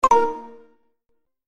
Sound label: Remove Device